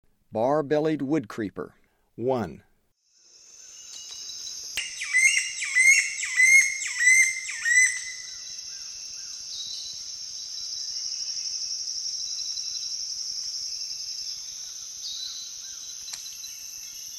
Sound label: Bar-bellied Woodcreeper; S. America; Eastern Lowlands